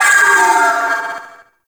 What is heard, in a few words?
Vocal Death; Warframe; Sentients; Sentient Trooper